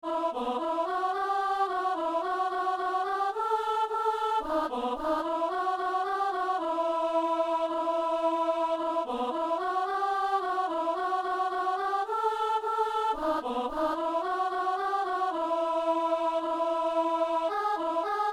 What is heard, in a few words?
soprano; Debout ! Resplendis; Messe du 17 janvier à Saint-Denis